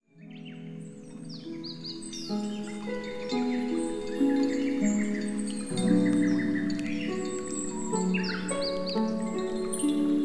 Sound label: Southern